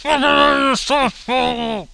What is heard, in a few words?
die; super trench attack